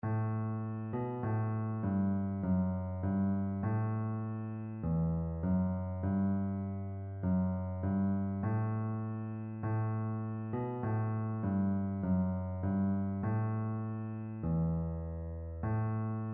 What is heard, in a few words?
mode identification